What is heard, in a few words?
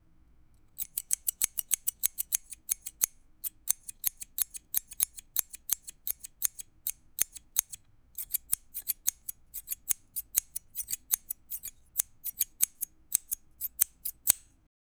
scissors cutting metal; metalworking